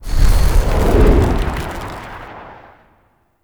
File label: Energy Spike Path Rumble; Powers